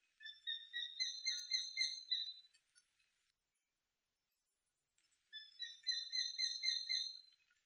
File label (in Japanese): tsumi nakigoe